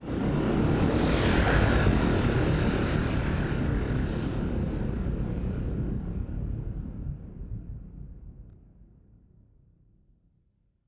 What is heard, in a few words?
ship move; juggernaut; Stellaris; machineage